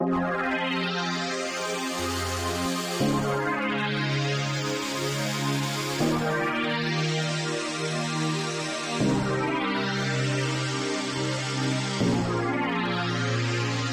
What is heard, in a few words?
pad ethereal ambient